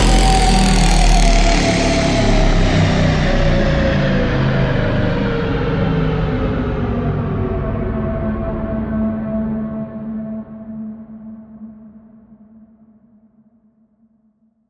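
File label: Power Down Rattler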